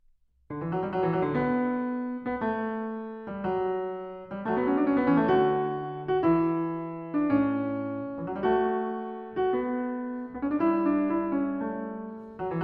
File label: Fugue No. 5 in D major, Well-Tempered Clavier; BWV 850; Bach; Book 1